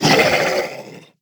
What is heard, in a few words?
Monster Attack